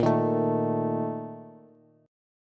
A sharp Major 7th Suspended 4th, Bass; strummed; JGuitar

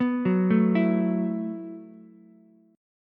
B 7th Suspended 4th B down Ukulele; B7sus4 arpeggiated; chord shape; JGuitar

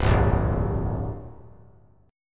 C 7th Suspended 2nd C down; C7sus2 strummed; JGuitar

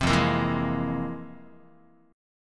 F Minor Major 11th Guitar, chord; strummed; JGuitar

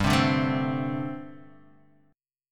G Suspended 2nd Suspended 4th, Gsus2sus4 chord; strummed; JGuitar

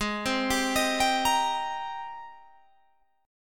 G sharp Major 9th G sharp, Guitar Open G

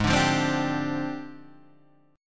F Minor 6th Add 9th, guitar chord

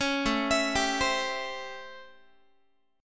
A 7th Sharp 9th, Guitar; A7#9; arpeggiated

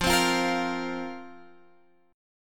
F Suspended 4th F down Guitar Open F

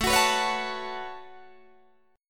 A 6th Add 9th A down Guitar Open D

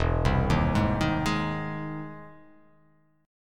E Augmented Major 7th E, E+M7 chord; arpeggiated; JGuitar

